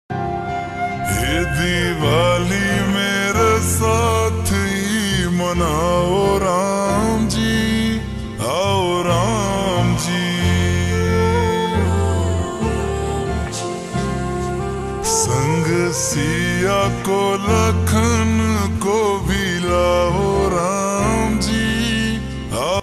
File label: Ringtone slowed and reverb